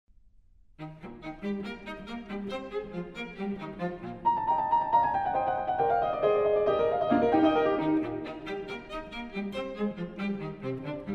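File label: piano trio; franck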